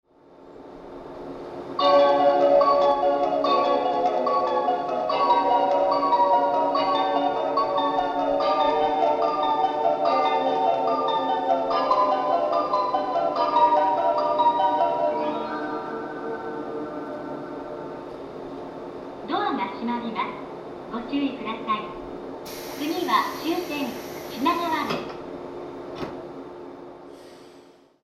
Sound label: melody